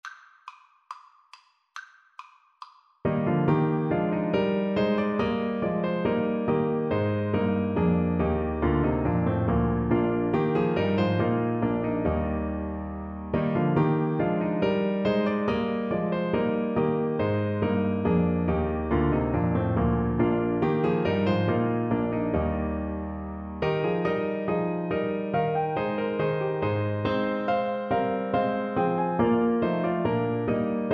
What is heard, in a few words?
wir gehn nun wo, Trombone